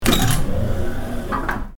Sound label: auto door